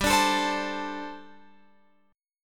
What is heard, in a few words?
G Suspended 2nd Suspended 4th, Guitar Open F; Gsus2sus4; strummed; x,14,14,16,15,16